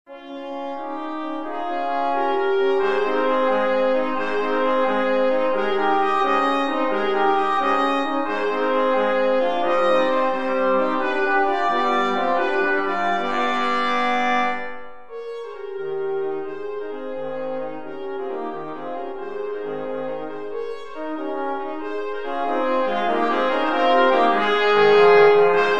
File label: brass trio